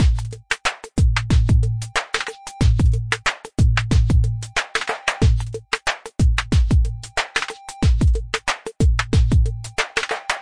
crunchy analog bounce, drum loops and breaks, 092 bpm; 091 to 100 bpm